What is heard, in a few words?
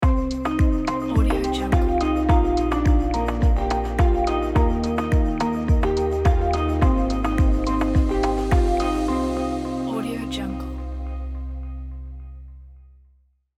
logo presentation; red-ninja